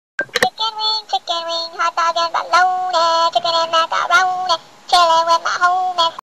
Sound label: chicken wing song